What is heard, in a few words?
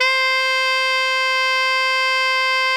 alto FF C 4, saxophones; Greytsounds; Philip Wolfe David Crozier's Saxophones